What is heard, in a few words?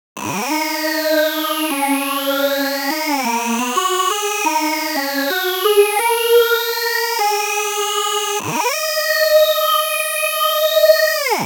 lead